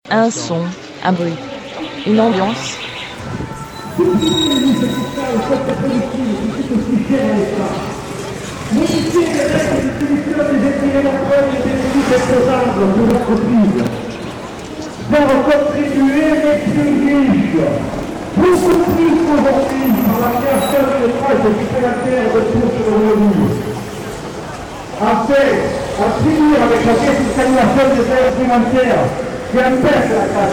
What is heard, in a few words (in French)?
Un son un bruit une ambiance